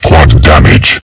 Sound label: Power Quad Picked; powerup; cstrike